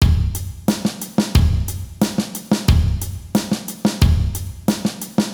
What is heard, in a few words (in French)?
Rythme; batteur